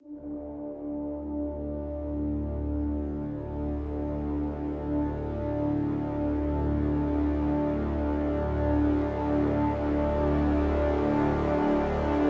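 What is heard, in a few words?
Crescendo Brass Swell Full (D)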